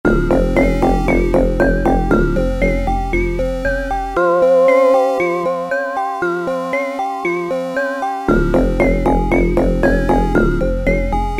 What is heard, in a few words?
Strange power